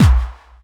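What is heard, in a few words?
snare drum bass drum, Dance Mega Drums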